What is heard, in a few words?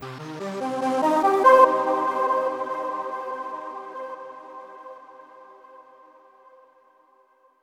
Stinger Puzzle Tone